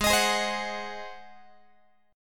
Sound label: Ab 7th Ab Guitar 4A,4E,5A,5D,5G sharp,6C sharp; Ab7 strummed; x,16,18,18,16,17